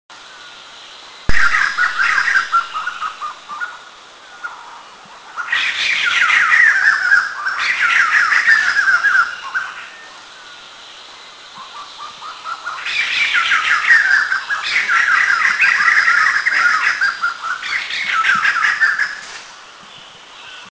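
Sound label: White-crested Laughingthrush; calls; Eaglenest; India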